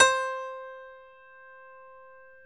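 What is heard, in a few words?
C4 grand piano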